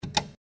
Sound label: key press; garrysmod; glorified banking